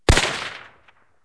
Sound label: m1 carbine